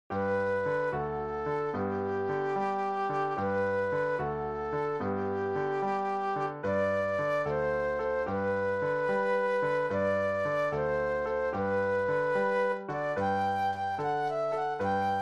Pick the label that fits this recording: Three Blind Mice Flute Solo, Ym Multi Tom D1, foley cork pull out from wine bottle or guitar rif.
Three Blind Mice Flute Solo